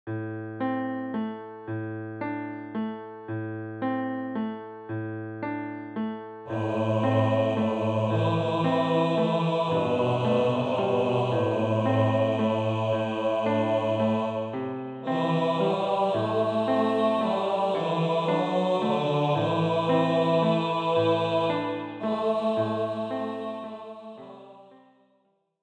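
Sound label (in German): Tiefe Stimme; Scarborough Fair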